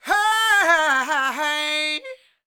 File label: SOUL, Vocal Planet, Blues & Soul; CW SOUL